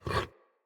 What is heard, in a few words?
Tame Idle Alert Turn; Enemies; Kubrow